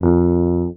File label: TUBA STRA F1, Tuba Straight; Orchestral; DirectWave; Varazdin Orchestral